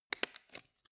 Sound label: stapler